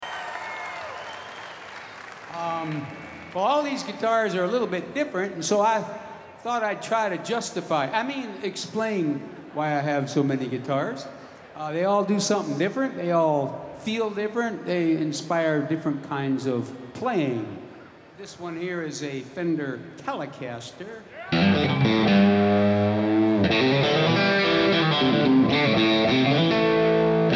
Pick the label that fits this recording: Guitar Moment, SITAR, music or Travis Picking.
Guitar Moment